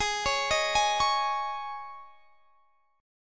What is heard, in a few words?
G sharp Suspended 4th G sharp; arpeggiated